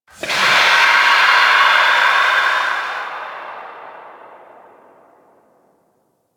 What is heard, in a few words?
Terraform Machine Gas Burst Distant E; Asteriod Transition